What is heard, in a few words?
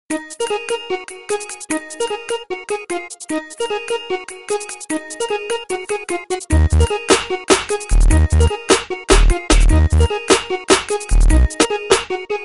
Loop, hiphop